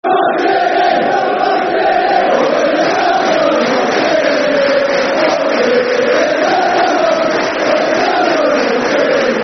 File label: Football Chants; When The Town Goes Marching In; Ipswich Town Itfc